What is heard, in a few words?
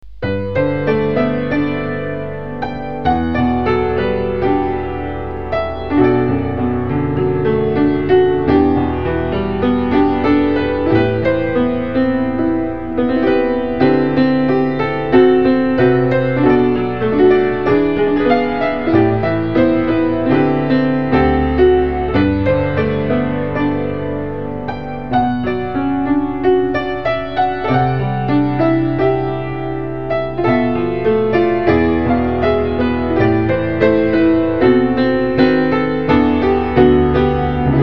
improvisation